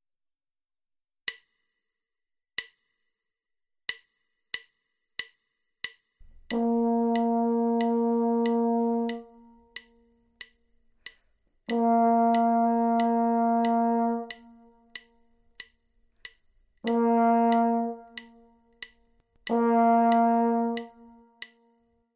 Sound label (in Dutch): Stemtonen